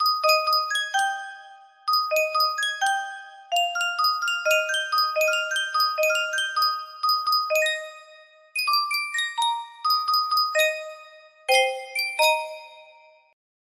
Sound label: yunsheng music box